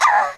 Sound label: Scream